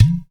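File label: percussion udu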